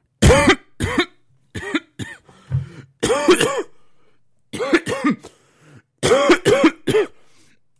coughing

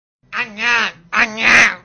ayaaaaaas

